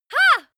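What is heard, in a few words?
female attack ya soft, screams; schreie; menschen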